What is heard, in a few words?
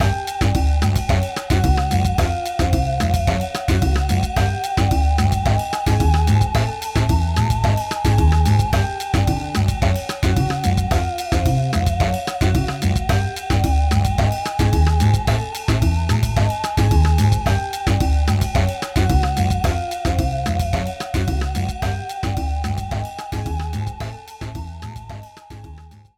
Round 3 Background Loop; Jackbox Games; The Jackbox Party Pack 1; FIBBAGE XL